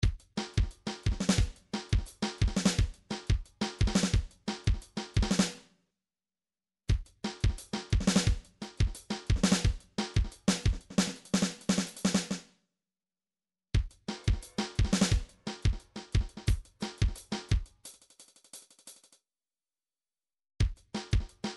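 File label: drums pattern, midi; Paul Kodish Dangerous Drums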